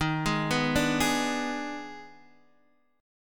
D sharp Major 7th Suspended 4th Sharp 5th, Guitar, Open D